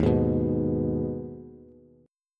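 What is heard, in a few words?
E flat Suspended 4th Sharp 5th, Bass; Ebsus4#5 strummed; JGuitar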